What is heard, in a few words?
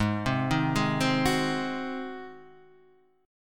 G sharp 7th; G#7; arpeggiated; 4,3,1,1,1,2; JGuitar